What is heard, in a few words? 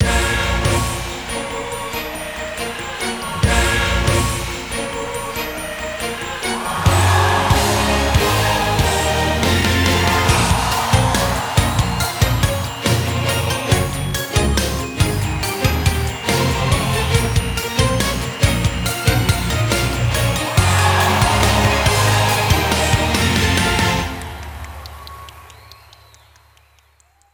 Mission Grand return Victory; Forced Showdown